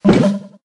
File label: mortar deploy